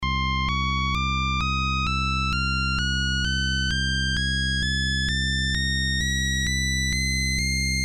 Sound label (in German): oberton